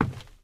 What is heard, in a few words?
WOOD, steps; Terrarum